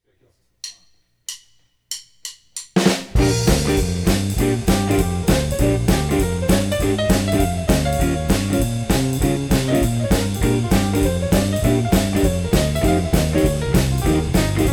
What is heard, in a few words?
Fast Swing in B flat, Blues